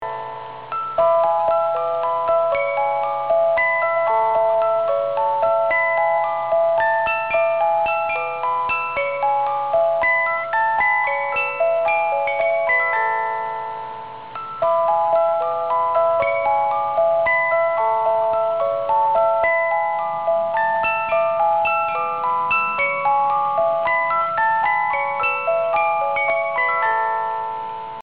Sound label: Blue Danube Waltz